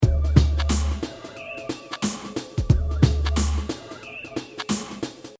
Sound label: Hip Hop Drum Loop 90 Bpm Swing With Screech; Everybody Now; Lo-Fi; Royalty Free Drum Loops; LynneMusic